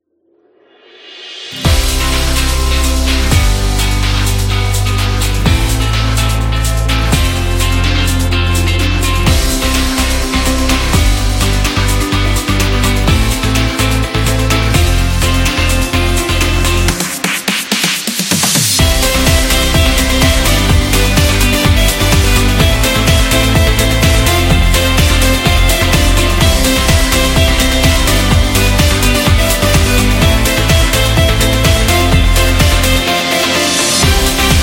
Upbeat Pop

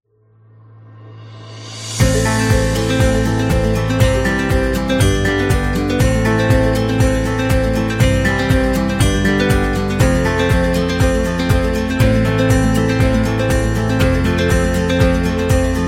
Medical Documentary; eco-friendly

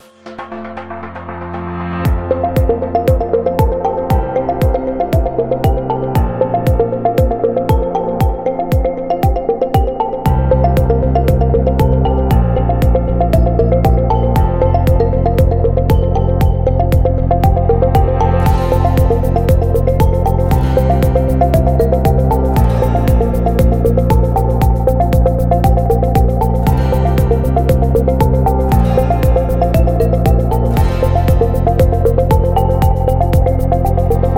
Elegant Corporate, corporate music; corporate music vol 9

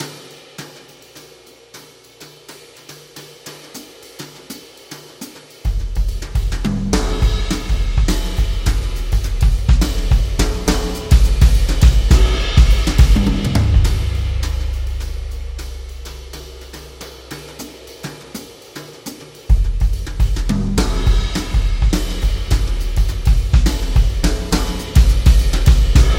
Jazz Energy; jazz club

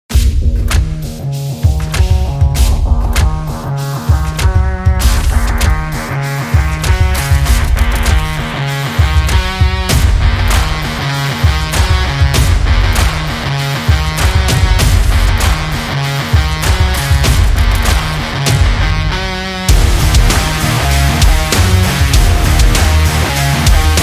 Stomp Rock; electro rock

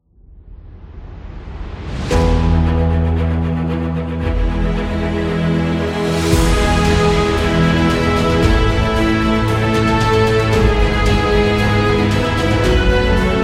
Epic Award Winning, epic music; epic music vol 3

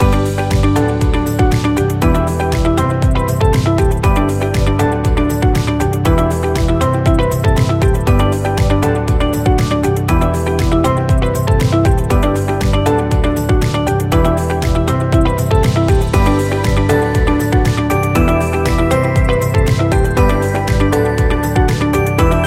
Positive Loop Corporate

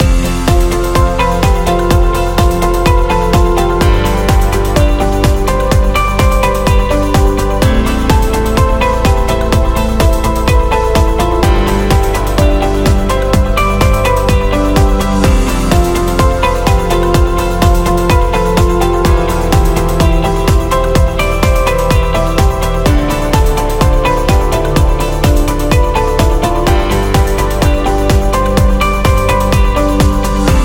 Business Loop

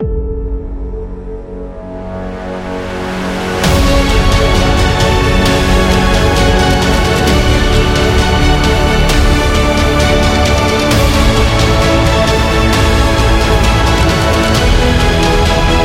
Epic Win

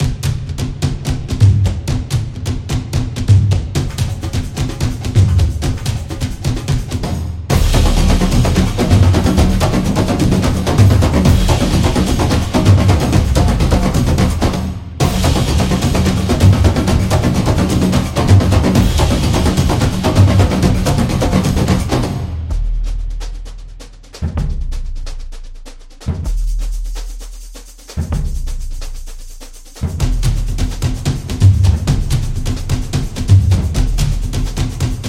Samba Drum; tribal dance

